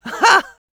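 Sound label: laugh short, French; Scout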